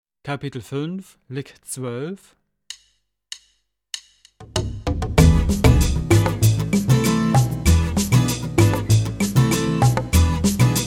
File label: Latin Style Guitar